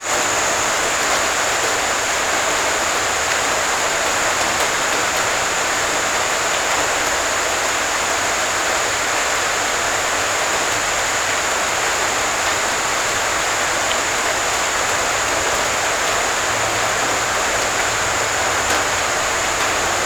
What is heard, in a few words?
nature rain garage roof internal distant thunder birds cicada